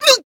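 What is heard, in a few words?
damaged, Japanese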